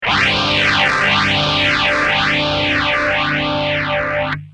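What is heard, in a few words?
guitar, Maestro phase shifter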